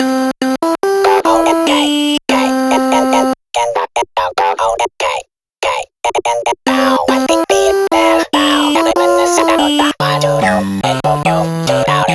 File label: Voices